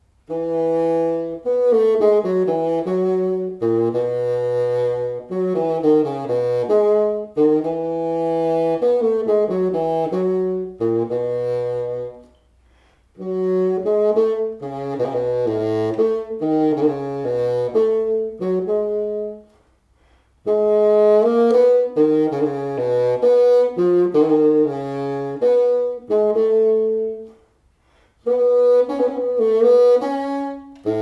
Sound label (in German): Fagott